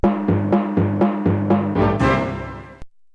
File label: Bell, Deurbellen